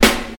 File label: smooth snare drum a key, Royality free steel snare drum sound tuned to the A note